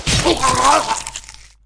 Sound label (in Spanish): apunyalar